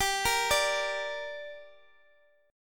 G Minor G down, chord

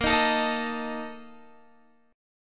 A sharp Major 7th Suspended 4th, Guitar; strummed

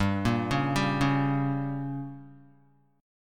G Diminished, Guitar; arpeggiated; JGuitar

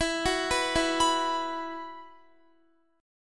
E Suspended 2nd, Guitar; arpeggiated; Esus2; x,19,18,16,16,19; 4F,4A,5C,5G,5C,6E

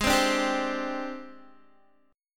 G sharp Major 7th Flat 5th, Guitar; G#M7b5; strummed; 15,15,14,12,12,x; 4F,4A,5C,5G,5C,6E; JGuitar

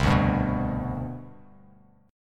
C Major 7th Suspended 2nd, C, down, Bass Standard; CM7sus2 strummed; JGuitar

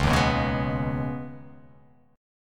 Db 7th Suspended 2nd; JGuitar

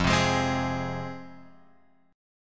F Minor, F, Guitar, Open F; strummed; x,0,0,3,3,3; JGuitar

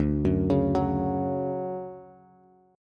D Major 7th, Bass; arpeggiated; JGuitar

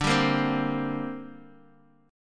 D 7th Suspended 4th Sharp 5th down Bass Standard; D7sus4#5 strummed

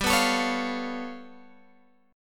G 9th Flat 5th, Guitar, Open G

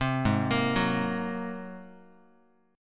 G sharp 9th G sharp, down, Guitar; arpeggiated; chord; 3,4,1,4,x,x

